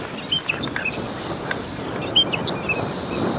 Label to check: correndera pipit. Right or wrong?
Right